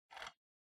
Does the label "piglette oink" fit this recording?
No